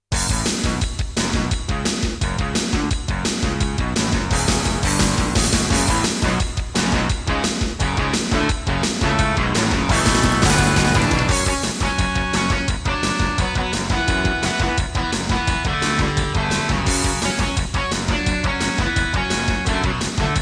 Intro, Boss Dr. Synth DS-330; Duke Nukem 3D

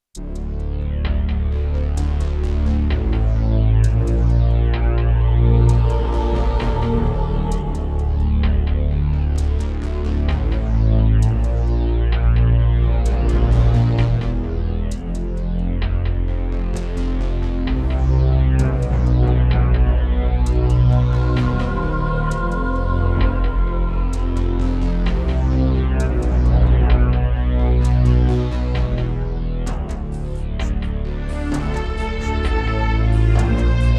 Roland SC-55mkII; Descent II - Briefing

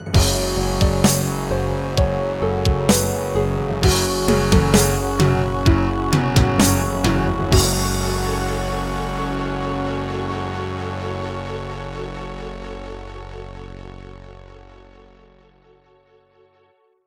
Yamaha MU5; Doom (Sigil); Introduction

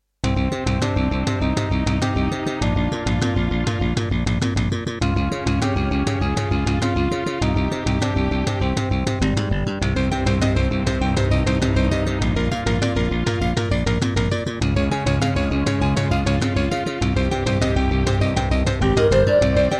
Roland SC-88 Pro 55 MAP; Introduction; Hocus Pocus

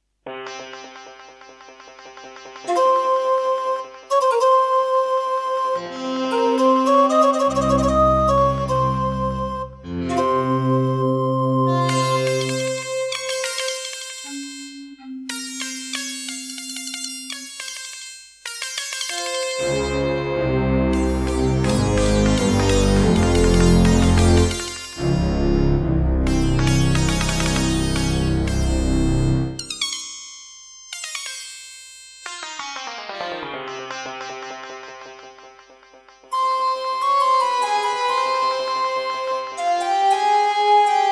Yamaha MU2000EX XGMODE; Prince of Persia 2 - Intro

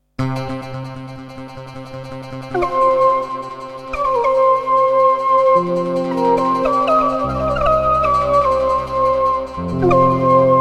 Intro, Roland MT-32; Prince of Persia 2 - Intro

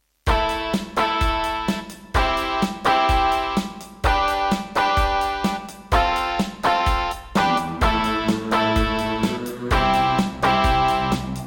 Turtle Beach Rio; raptor call of the shadows

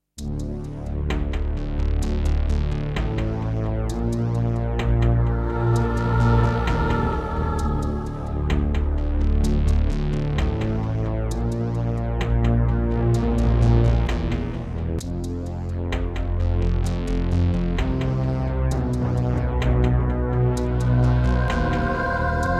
Terratec WaveSystem SWC001-2; Descent II

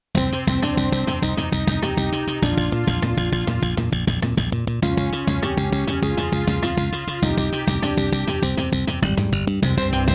Introduction, Roland XV-2020; Hocus Pocus

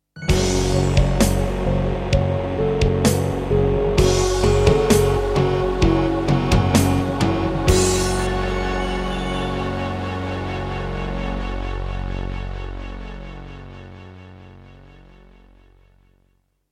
Trust Korg Super Sound Module; Doom (Sigil); Introduction

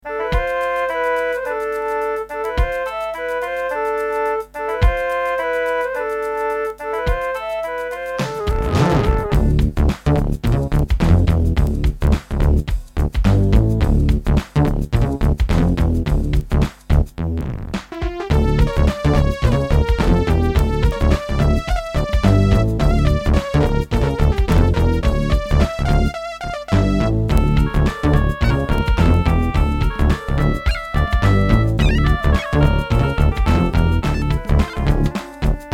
Turtle Beach Tropez; Lost Vikings

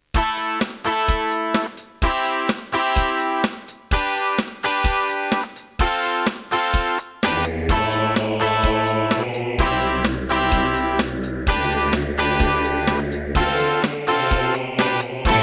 Roland SC-8820; raptor call of the shadows

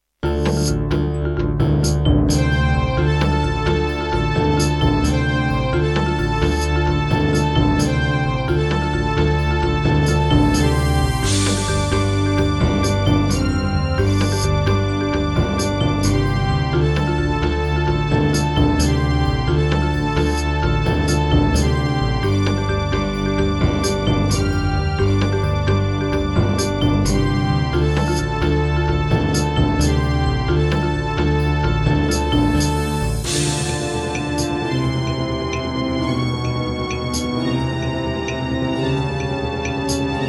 Serdaco Crystal Blaster C1; Heretic

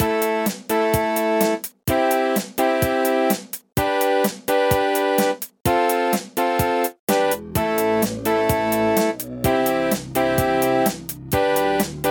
Alesis Harmony 32; raptor call of the shadows

